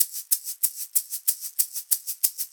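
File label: shaker egg hi, percussion; ILIO; Synclavier Percussion 2; World & Orchestral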